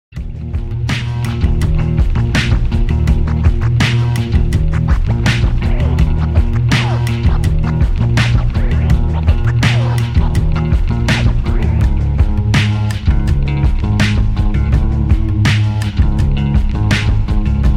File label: Double Bass